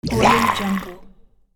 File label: swamp monster